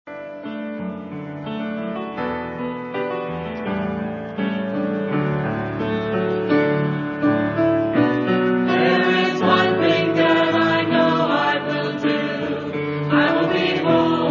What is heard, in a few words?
choir